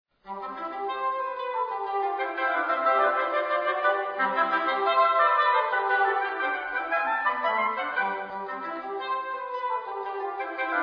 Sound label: menuetto; Beethoven